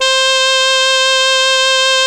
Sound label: soprano sax; gmplay; reed